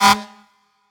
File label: ultrafast win short; Burning Reels; wazdan